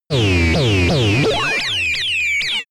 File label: synthetic horn